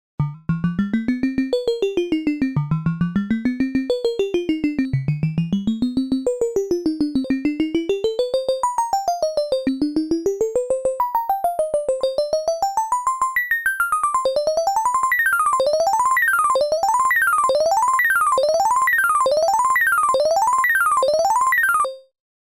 zwei Blacet VCOs lineare FM melod. Moll

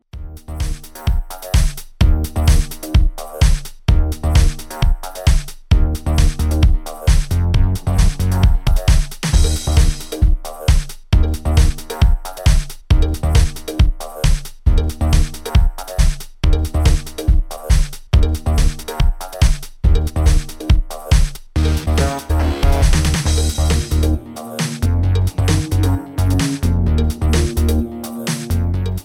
Pitch mit

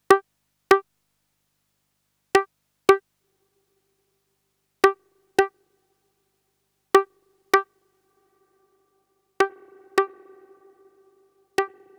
Moog Hallstaerke; Vermona VSR 3.2

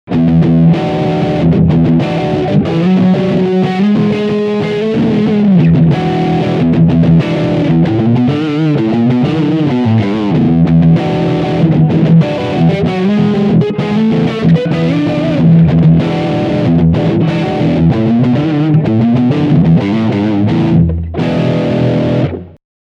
Riff beide Humbucker

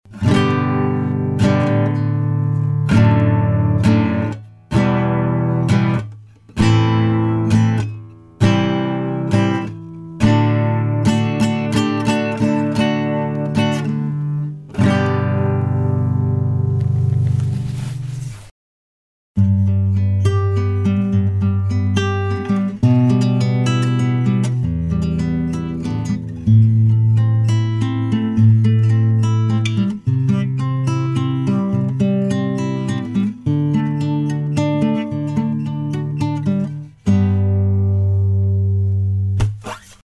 a-gitarre; IMG Stageline ECMS 90

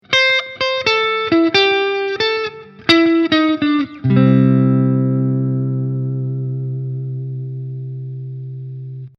Lick nach C Dur

